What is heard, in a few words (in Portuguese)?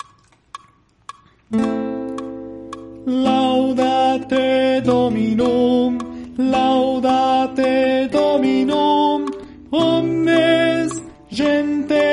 tenor; Laudate dominum